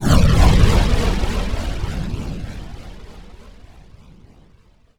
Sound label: Necromancy Summoning Magic Spell